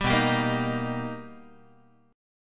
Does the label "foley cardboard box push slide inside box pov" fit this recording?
No